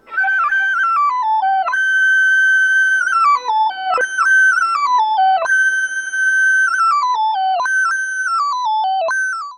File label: flute resynth